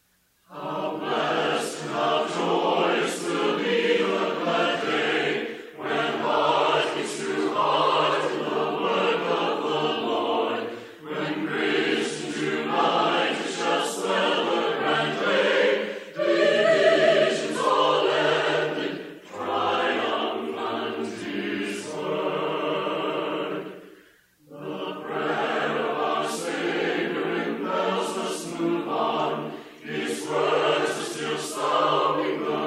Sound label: How Blest and How Joyous, hymns